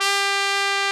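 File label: trumpet, cheese trumpet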